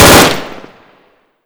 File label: m16 burst; svencoop; hunger; weapons; m16a1